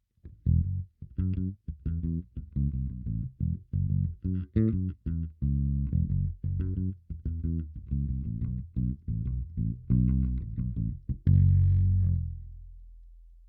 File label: clean, bass; MXR bass DI effect pedal